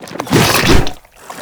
Strike Flesh; Enemies; Abilities; Warframe; Proto Infested Ancient

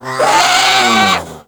Combat Vocal Death; Warframe; Catbrow

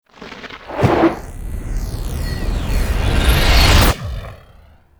Hazard Start; Volt Prime Boss; Warframes

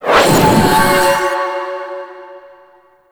Glass Reflect Cast; Warframe

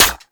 Heavy Pistol Mech Alt B; Warframe; Weapons; Zariman Heavy Pistol

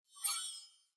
Sword Whoosh Accent Long; Weapons; Player; Swords; Fey Sword

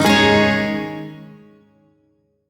Shawzin Yo Chord; Music; B; Warframe; Duviri; Chords

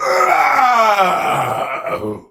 Death; Warframe; Tau; Dax; Ashigaru

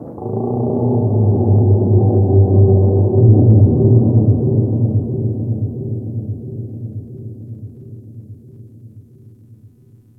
Ocean Underwater Creatures Large; Warframe; Grineer Ocean

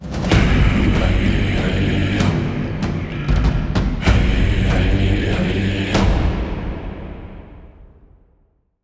Mission Complete Success Cue; Entrati; Warframe

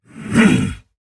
Attack Effort; Warframe; Dax Heavy; Battle Barks

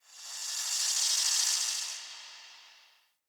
Under City Tiny Creature; Ambience

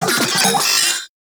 Vocal Alerted; Warframe; Corpus; Arisoctrats; Mini Moa

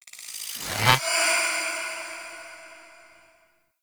Stomp; Warframe

